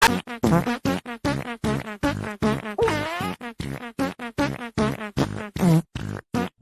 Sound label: walking fart; spongebob